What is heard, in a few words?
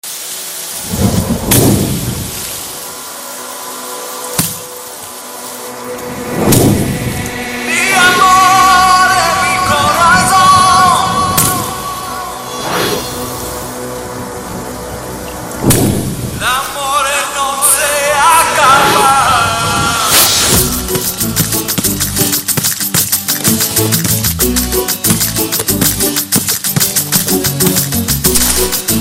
Back Ground; Dhoom